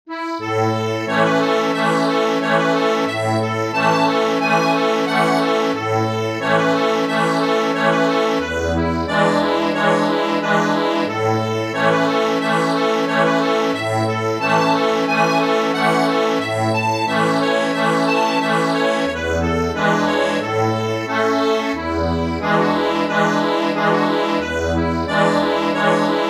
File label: accordion